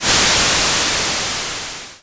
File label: liquid cutter